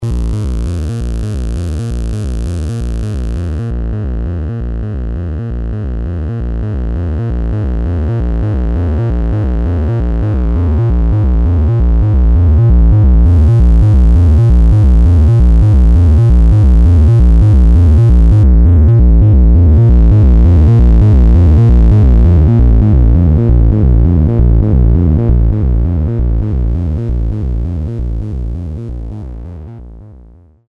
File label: analog synthesizers sequencers; dr zee workshop